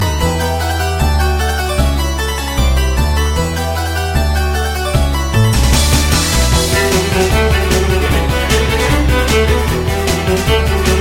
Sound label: Epic Violins